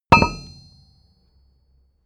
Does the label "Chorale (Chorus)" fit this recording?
No